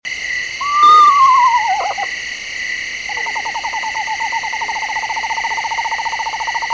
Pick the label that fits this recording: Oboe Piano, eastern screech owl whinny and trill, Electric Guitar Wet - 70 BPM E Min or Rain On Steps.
eastern screech owl whinny and trill